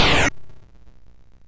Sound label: sid MOS6581R2; hvsc